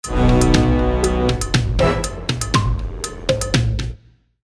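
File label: game background music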